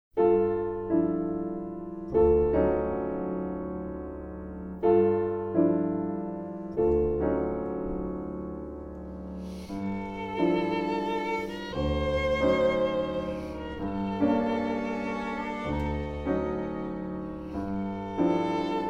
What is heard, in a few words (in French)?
Musique du film; Quelques jours en septembre